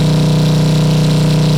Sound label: 66 Cobra Loop, Automobiles